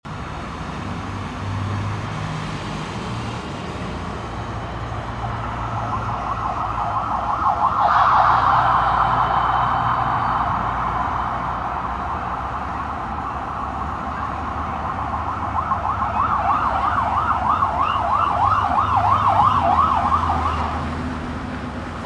police sirens

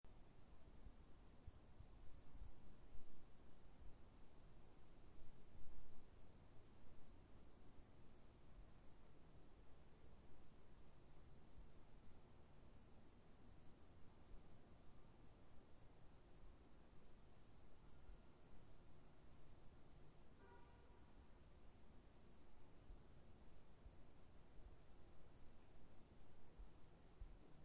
crickets at night

